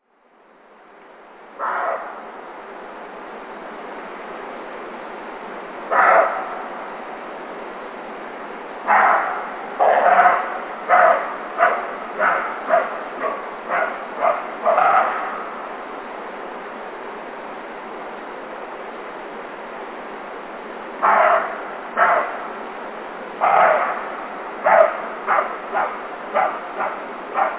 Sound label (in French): chevreuil; cris d'animaux; enregistrés par Jacques Prévost de la LPO